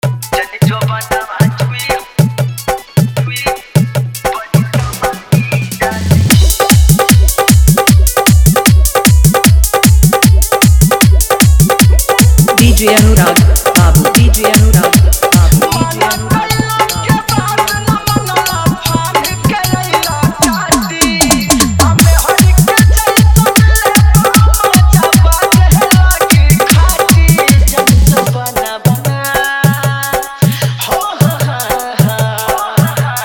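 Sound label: Hard Dhollki Bass Mix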